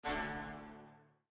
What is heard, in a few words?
devcard bought